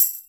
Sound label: soft hit whistle